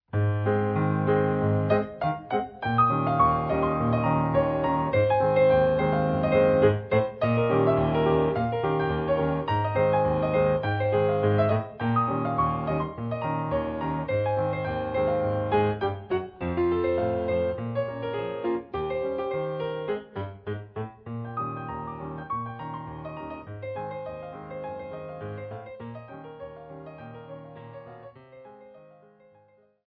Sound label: Battements tendus Ragtime